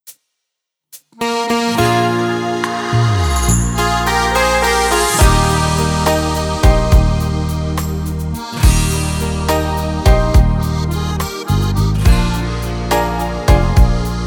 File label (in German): Karaoke ohne Chor